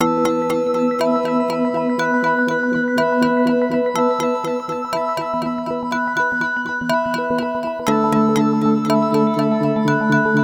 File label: Magic Clock Shop, fantasy wonder